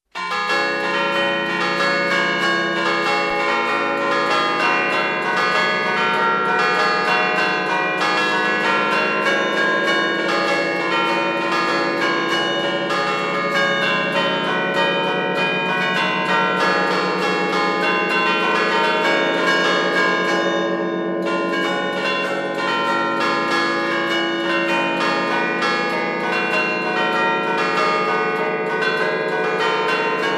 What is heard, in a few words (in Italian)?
armonia, campanari